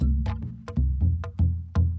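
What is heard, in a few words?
Calm Far, music; From Dust; ps3; xbox 360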